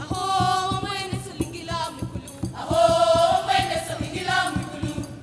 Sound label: AHO D6; Spectrasonics; Heart of Africa 2